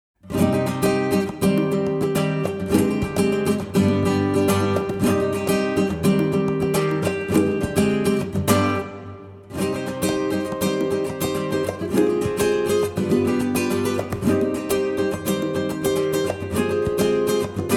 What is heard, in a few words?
Dance Portuguese without piano short